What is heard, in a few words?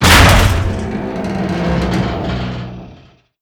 airport elevator groan